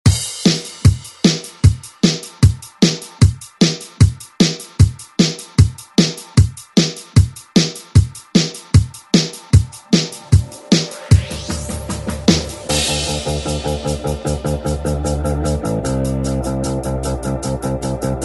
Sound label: 80s ReDrum; DJ Mhark